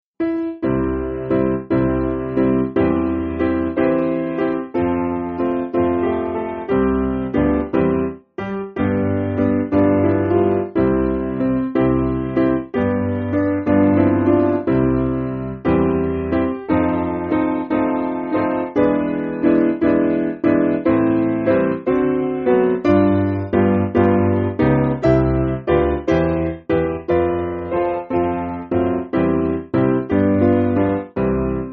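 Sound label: Piano, Backing Files for Churches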